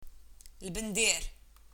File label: bendir